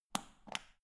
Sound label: power button turn on off